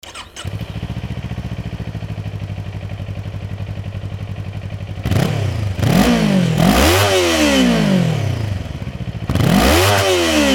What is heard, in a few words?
ninja400 ex400g normal; ノーマルマフラー; KAWASAKI ニンジャ400 マフラー